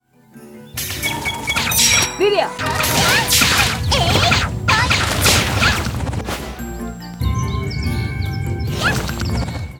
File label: system jump